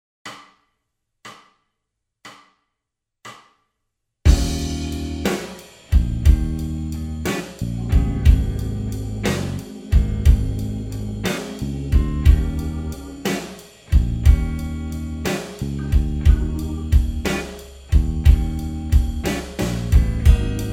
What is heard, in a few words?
Lazy Day Blues